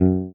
bass, note; Minecraft; Votive World

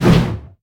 strong, player attack; Minecraft; Votive World

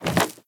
ladder, step; Minecraft; Votive World

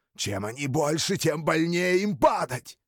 celebrate, pillager; mob; Minecraft; Votive World

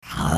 zombiepig hurt; Minecraft; Votive World

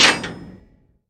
close, iron door; iron trapdoor; Minecraft; Votive World

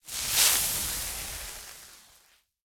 fuse; Minecraft; Conquest Default

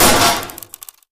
damage, iron golem; mob; Minecraft; Conquest Default

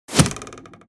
bow hit; Minecraft; Votive World

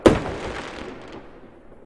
blast, fireworks; Minecraft; Conquest Default

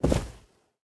cloth, step; Minecraft; Conquest Default

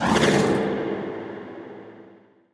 power, beacon; Minecraft; Votive World

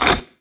stone button; Minecraft; Votive World

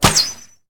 crossbow shoot; item; Minecraft; Votive World

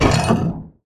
stone pressure plate off; Minecraft; Conquest Default

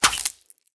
berry bush hurt, player hurt; Minecraft; Conquest Default

